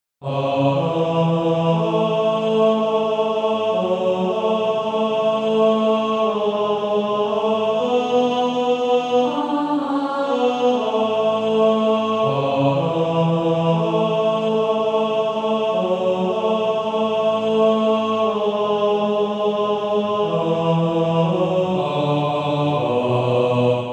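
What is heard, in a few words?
Male Choir, Practise Music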